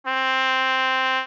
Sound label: trumpet